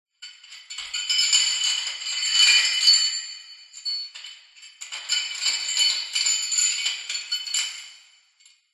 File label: horror chain shackles movement dungeon prison reverberant